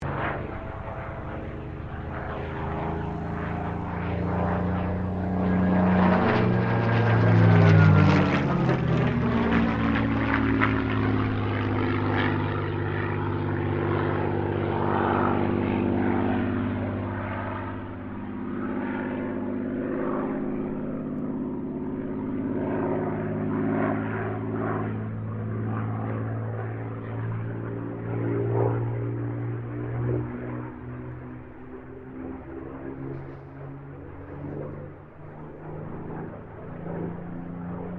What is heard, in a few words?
AIRCRAFT SUPERMARINE SPITFIRE MK-XVI PASS; Airplanes (Sound Effects:Vehicles)